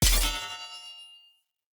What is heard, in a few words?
Short Sword Medium Parry Perfect Block Impact; Weapon Impacts; Player; Swords; Combat